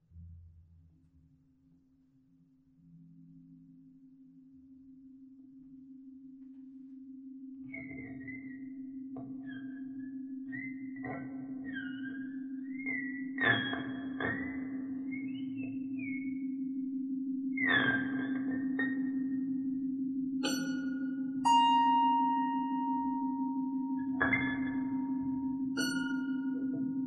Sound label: noise